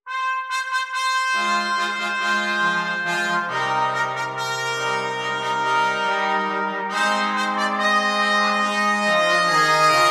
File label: quartet brass; drunken sailor